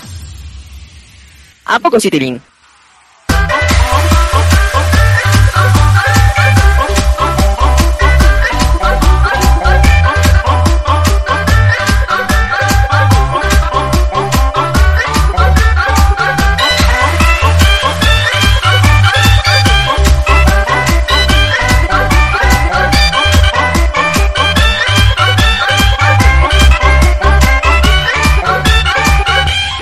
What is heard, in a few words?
drop